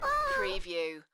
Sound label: Cat Meow